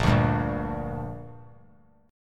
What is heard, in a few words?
A sharp 6th; strummed; JGuitar